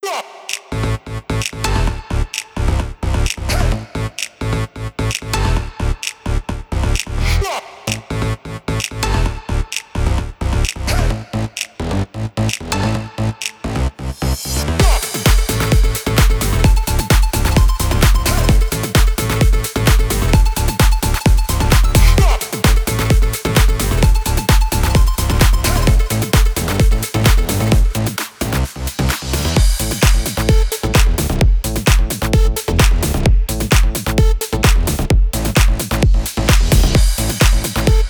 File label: fullmix, house electronic techno; By Burak Sound Bank